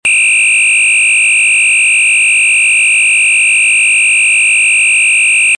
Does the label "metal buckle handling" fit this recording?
No